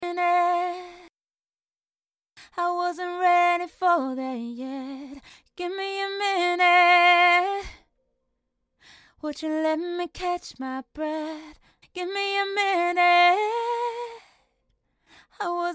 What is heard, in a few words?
pop rnb 150 Cm